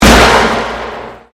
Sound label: Convolution Pulse